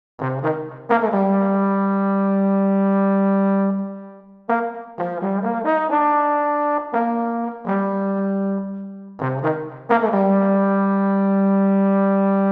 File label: Street Trumpet Somber Melody 120